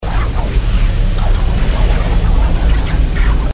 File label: anticipation loop; Crystal Furnace